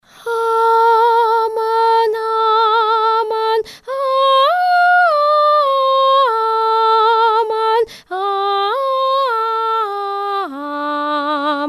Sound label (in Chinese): 七叠阿们人声